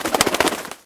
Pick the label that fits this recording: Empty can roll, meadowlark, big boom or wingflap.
wingflap